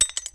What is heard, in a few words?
metal; Mafie 1